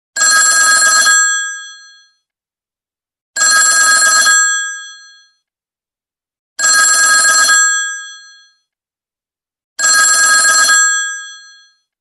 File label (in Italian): Vecchio Telefono